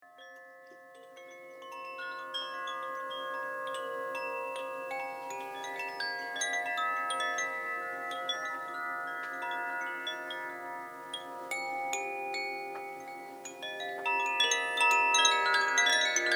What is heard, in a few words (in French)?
Carillon